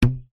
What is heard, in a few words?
hair band pluck twang; foley; zapsplat